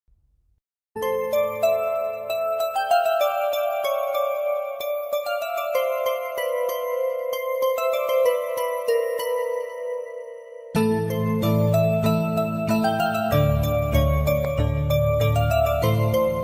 instrumental tamil ringtones bgm; premikula roju

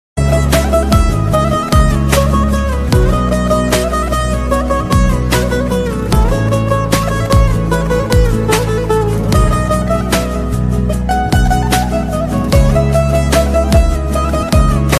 turkish ringtone instrumental music

